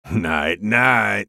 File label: spellcast effort; Graves; Character Voices - English